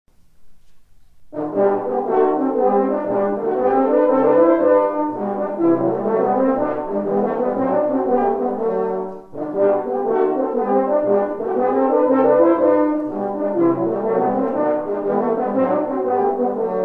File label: Country Dance